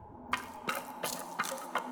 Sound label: walkcycle bones; hollow knight